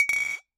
Bottle Struck

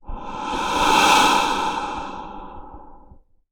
Wind, Melodica

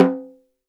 Snare Drum; Percussion Samples

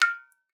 Drips

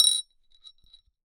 Marble On Base, Large Wine Glass

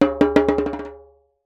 Jembe Bounce; Percussion Samples

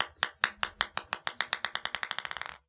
Ping Pong Ball Bounce; Balls

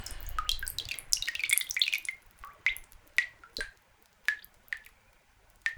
Dribble, Water Dribble

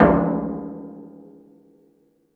Oil Drum

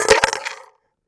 Coin On Collander; Coins

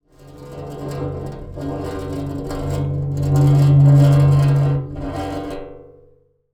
Pane Of Glass Scrape

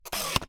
Camera Single

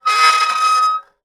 Soldering Iron Stand Drag

